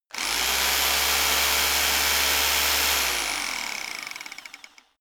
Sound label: rock drill chisel burst long; schrauben drehen hydraulik